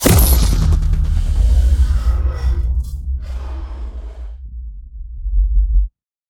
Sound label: sinematic complex tech hits; sonniss gdc